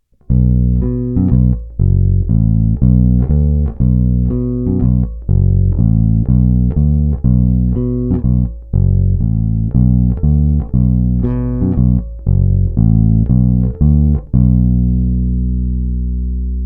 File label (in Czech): Hráno mezi krkem a snímačem, Lakland 44-64, Baskytara; Hráno nad snímačem; Tónová clona plně otevřená; klasické roundwoudy z ocelovým opředením; Bob Glaub; Použité struny jsou nějaké klasické roundwoudy z ocelovým opředením